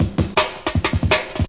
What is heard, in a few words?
164 fancy break; 161 to 180 bpm